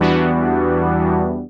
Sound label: 80s synthwave brass stab